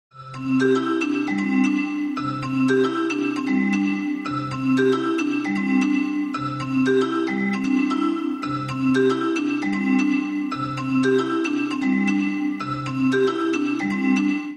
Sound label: Galaxy S7 Ringtone; Samung